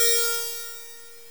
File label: guitar D#3; osu